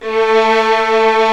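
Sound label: strings, 8pc, vibrato; String Master Series; Vlns 2-5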